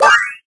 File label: cookie jump; Pastel meringue